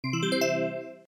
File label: goal won